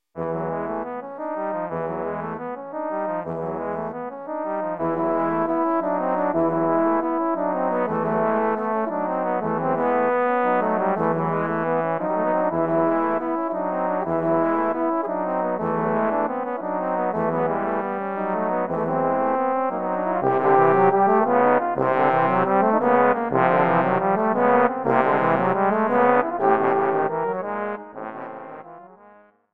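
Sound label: Bulgarian Rhythm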